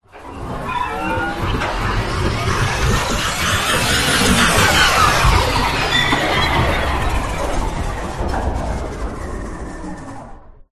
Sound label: Sci-Fi Power Up; Ryan Van Eerde